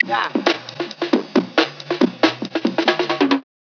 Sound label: funky soul psola ring mod